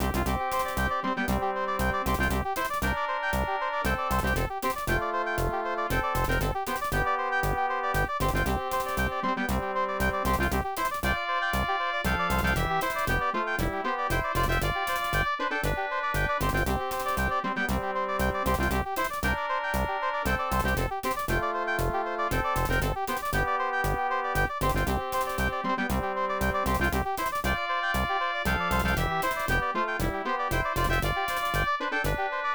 Easy Victory!; tokimeki memorial forever with you; ibm pc at; Practice Match

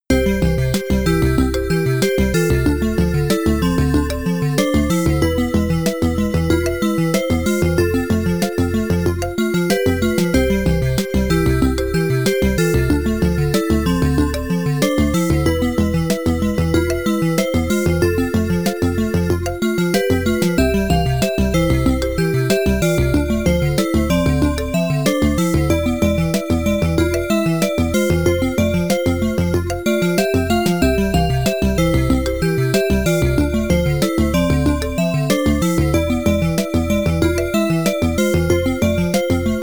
Jungle Continue; brian the lion; amiga

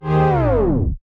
Tape Stop

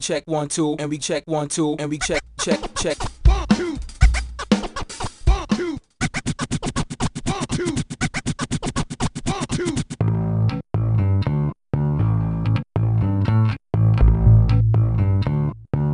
menu music; ESPN College Hoops 2K5; xbox

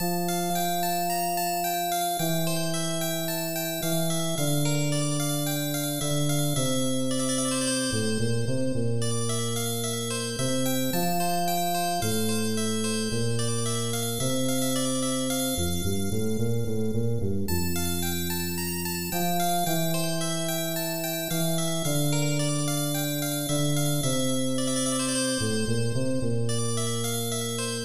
Church; Ganbare Goemon 3; SNES

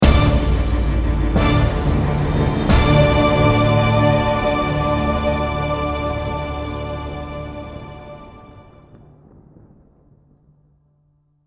Battle End; Rise of Keepers

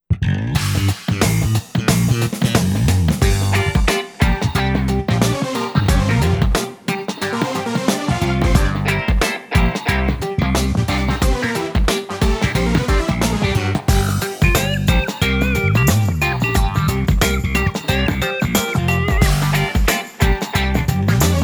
Funk Break; HCR 1 Menu Screen; Hill Climb Racing 2

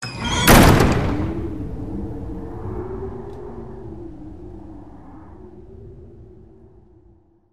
DOOR Close Wood Slam Workshop Wind; gamerip; The Unfinished Swan

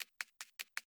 flukeman run loop

